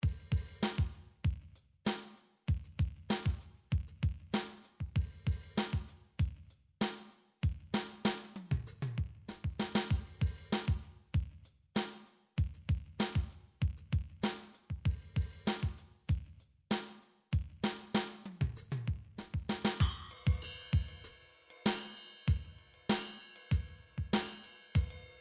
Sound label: drum midi funk; Drum Midi Files Collection